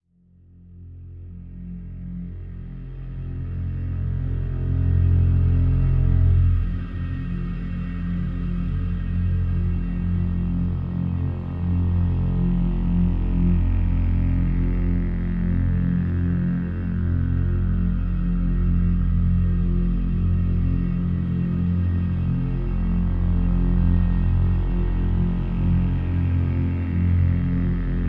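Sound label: Drone Reactor F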